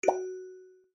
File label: plinko; casino